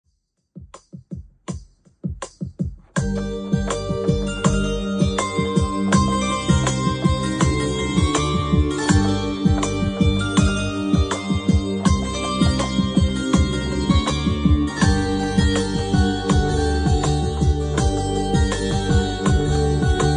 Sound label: song bgm ringtone melody ringtone bgm; 7g movie